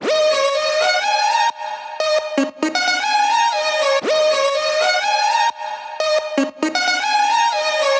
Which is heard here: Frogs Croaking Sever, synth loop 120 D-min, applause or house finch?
synth loop 120 D-min